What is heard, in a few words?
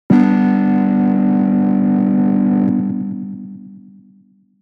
Empty Church, jup8